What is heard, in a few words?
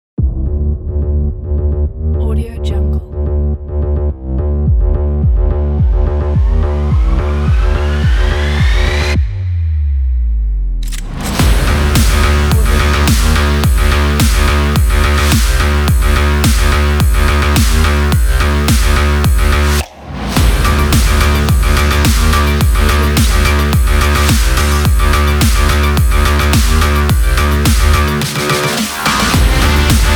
extreme sports intro pack; alivetunes